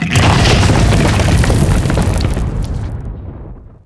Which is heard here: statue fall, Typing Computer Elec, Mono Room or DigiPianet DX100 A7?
statue fall